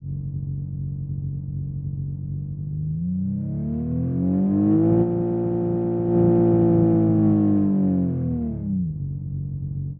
i6 german engine, engine sound

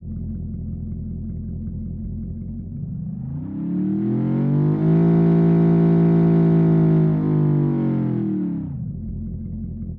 v8 american modern, engine sound

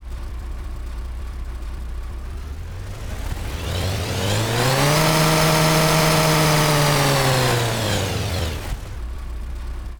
pickup engine sound

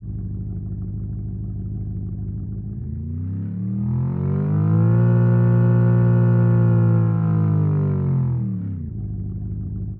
v8 german sport, engine sound

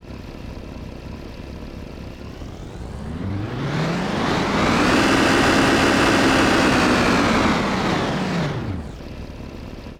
offroad, engine sound

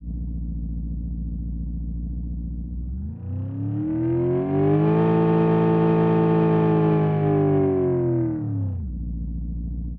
v8 german engine, engine sound

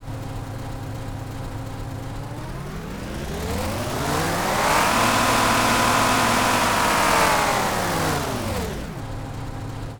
sports, engine sound

